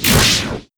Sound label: feather atk; deltarune yellow translation